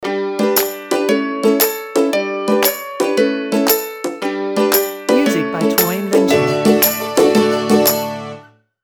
Swing and Smile stinger

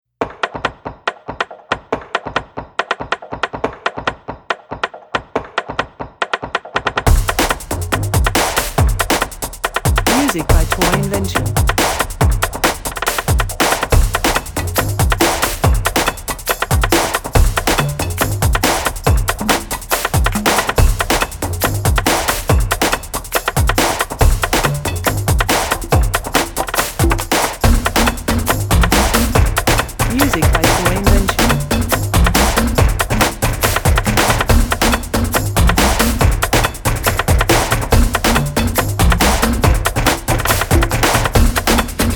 Percussion Beat

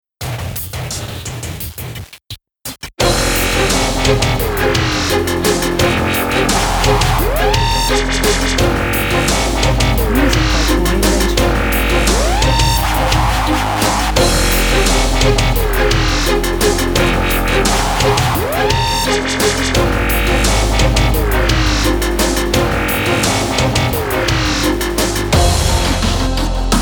Dubstep Alarm

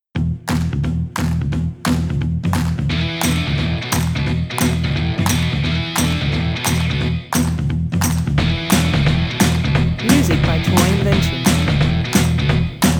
Indie Pop no vocals and pads

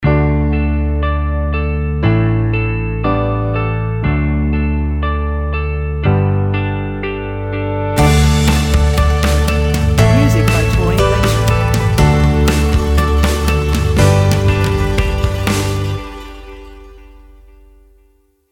Sparkling Hope stinger

